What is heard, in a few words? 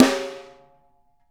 Snare Brush Hit; Essential Percussion